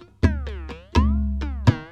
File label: PERCLOOP ETHNO 125; eJay